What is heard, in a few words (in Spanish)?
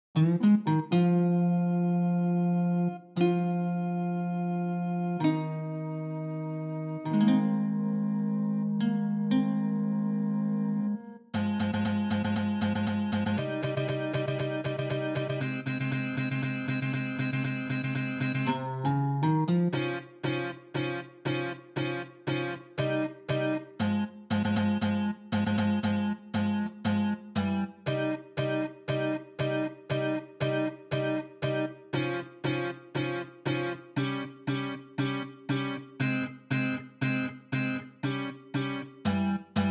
BAJO SEXTO